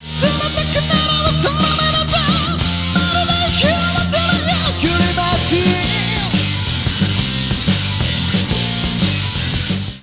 explod

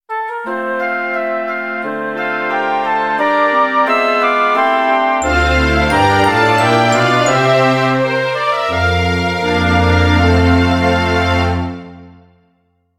gentle, jingle

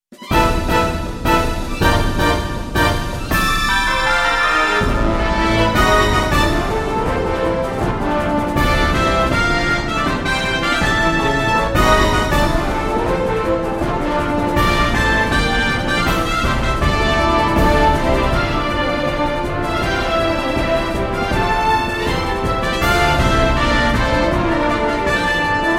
battle

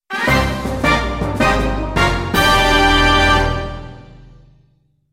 violent jingle

